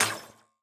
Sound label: Wire Cut